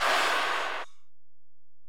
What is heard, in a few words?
EPerc, Alesis DM-PRO; 300 Drum Machines